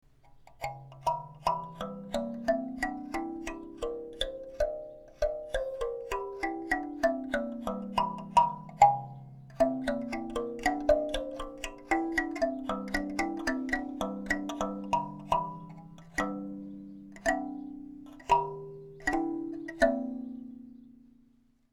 soundbeispiel daumenklavier; bausatz

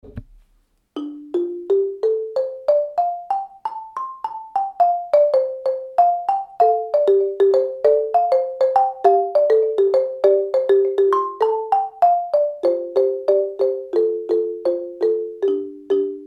soundbeispiel pentatonik xylophon

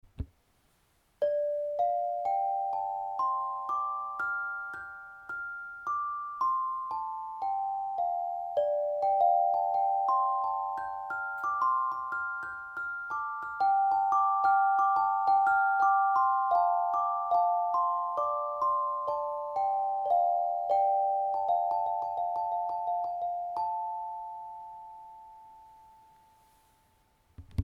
klangbeispiel pentatonik lithophon

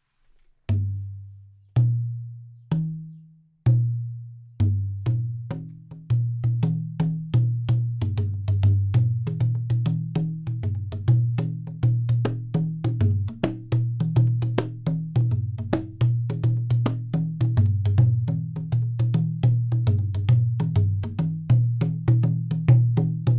soundbeispiel basstrommel set

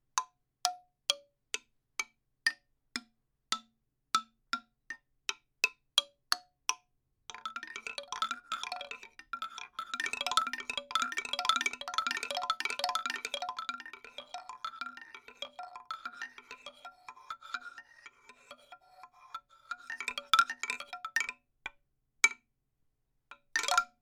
soundbeispiel ruehrxylophon

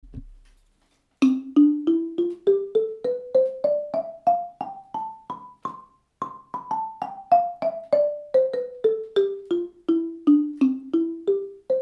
afrika xylophon

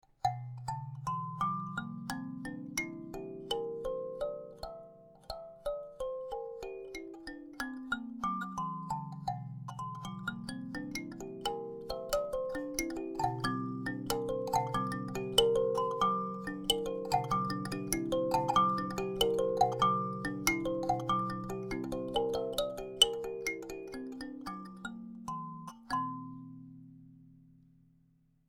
klangbeispiel daumenklavier

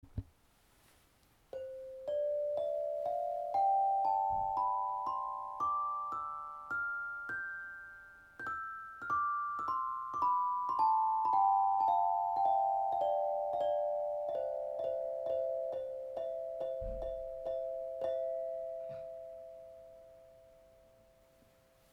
klangbeispiel c dur lithophon

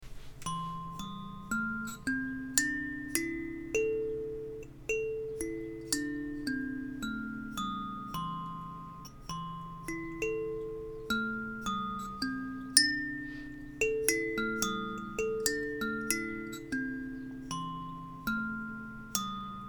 klangbeispiel kalimba

